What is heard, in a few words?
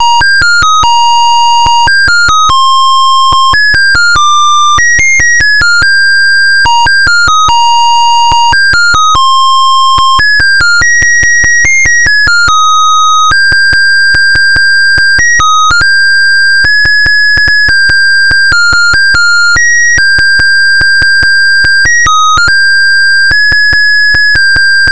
jingle bell, melody